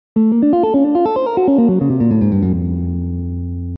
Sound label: Arpeggio